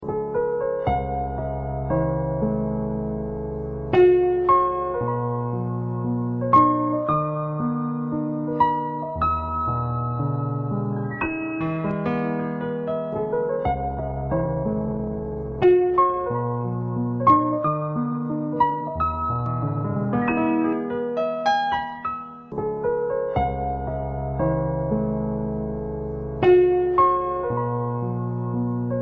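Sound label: uspokaivaiushee fortepiano, calming piano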